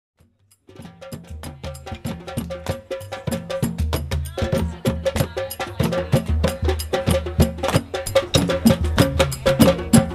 More Drums; michigan 2002

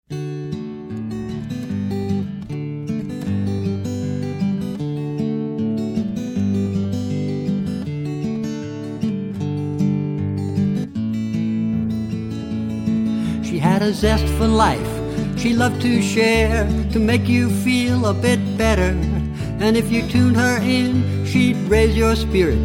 her whistle n spoons

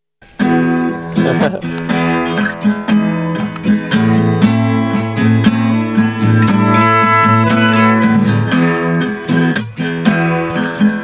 Trailside Guitar And Melodica

